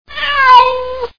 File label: Cat Meow